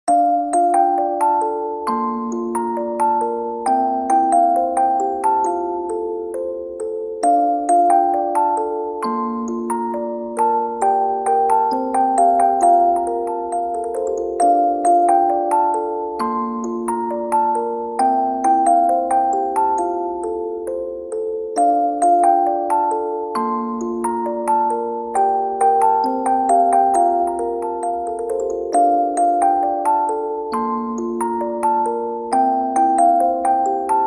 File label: Music Box; Anime Inuyasha ED